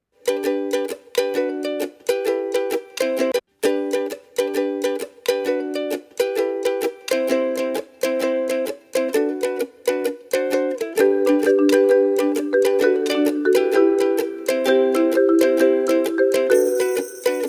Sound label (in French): bed pub; Habillage